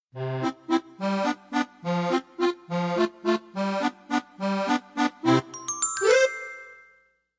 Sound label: jig